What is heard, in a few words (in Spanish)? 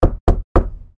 Puerta golpe